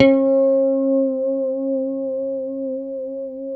D4 pick harmonics, guitars; Spectrasonics; Hans Zimmer Guitars VOL-1; JC-HARMONICS

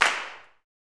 CLAPS, Gospel; CLAPS+SNAP

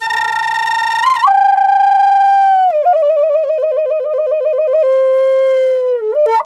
A WILD, FLUTE WILD; Big Fish Audio - Didgeridoo and Other Primitive Instruments

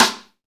SNR, Snare; Club-50 - Foundations Roland; Ext.Snare 2

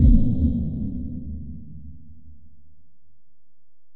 EPerc, Alesis DM-PRO; 300 Drum Machines

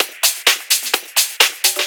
Discohouse Loop 128 BPM

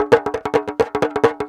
bata

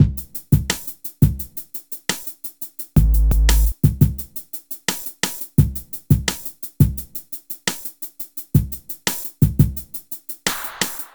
Beat, Hip-Hop

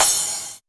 TR909 crash, Roland SH-32; Drumset 02

